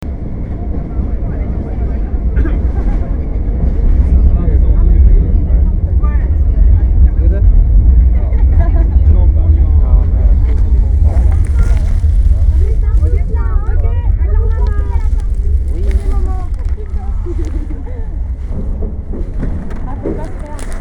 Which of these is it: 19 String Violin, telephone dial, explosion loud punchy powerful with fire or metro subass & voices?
metro subass & voices